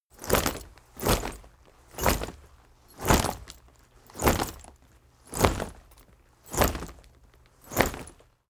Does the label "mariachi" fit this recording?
No